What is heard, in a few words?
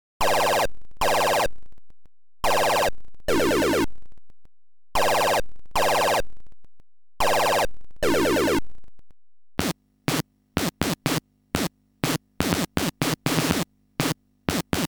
beep clock mechanism; MISTER BEEP